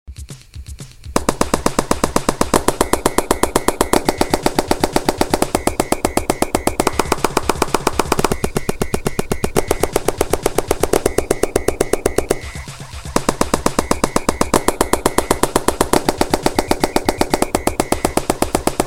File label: Clap Dance